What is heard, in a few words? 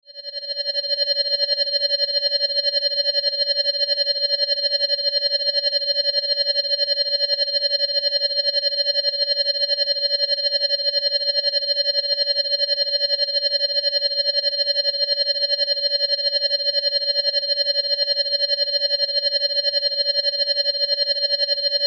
Sci Fi Telemetry Beeps Electronic Pan Flutter; Tech